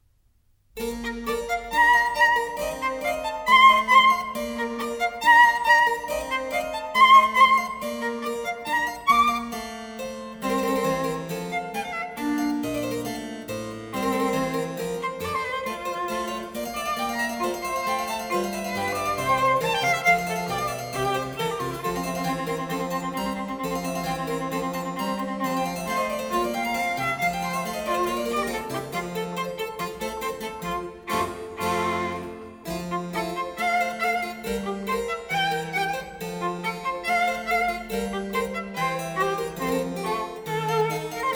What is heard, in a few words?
Sonata in Bb KV15 - Allegro grazioso, Violin sonatas; Mozart